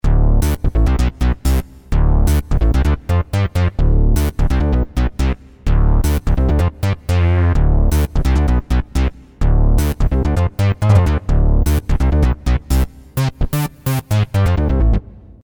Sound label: bass, synth roland jd800; bass-truzzo